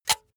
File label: razor retract comb fast; maschinen; rasierer; soundpool